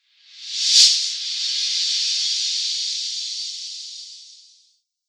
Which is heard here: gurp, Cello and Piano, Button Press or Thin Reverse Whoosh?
Thin Reverse Whoosh